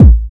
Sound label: Kick, drums; Sonic Foundry (Sony Creative Software); Drums & Drones; Construction Kits; Within Kit